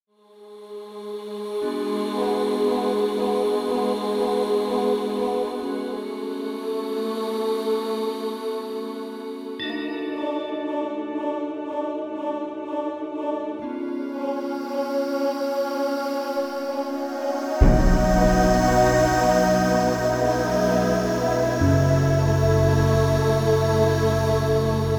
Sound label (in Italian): orchestra